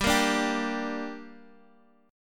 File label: G Minor, Guitar DADGAD; chordshape; down